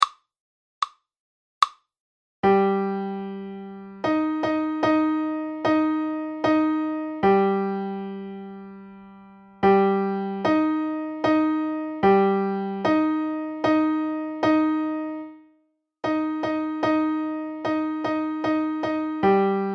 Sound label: minor 3; intervals